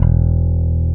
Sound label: bass 3 (E) long note; Sonic Foundry (Sony Creative Software); Ugly Remnants VOL-2; Basstuff